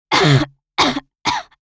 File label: Intense coughs teenage girl; cough sound effects; gfxsounds